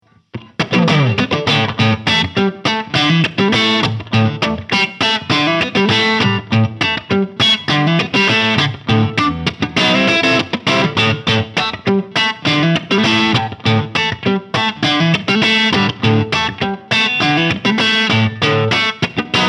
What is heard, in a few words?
Marshall 1987X Clean Single Coil